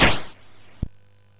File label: sid MOS6581R4; Skull and Crossbones